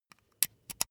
insert usb cable quick

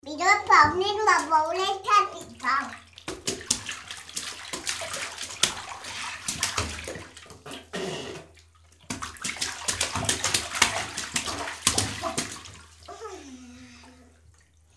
small child bathing

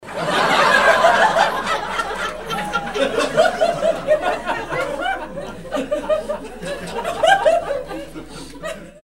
laugh long

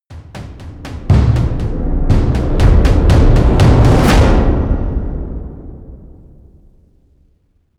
promo pad

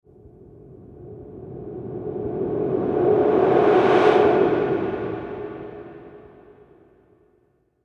metal rising

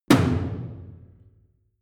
drum hit simple small

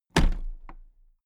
toolbox place upright heavy

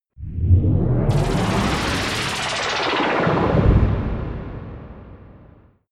alien rise and drop

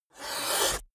fishman hiss

